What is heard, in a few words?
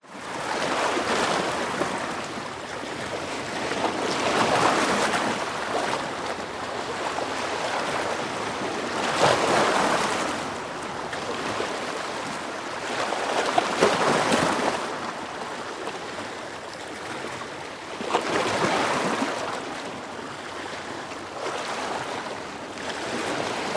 Sea Waves Calm Water Splash Spray; PMSFX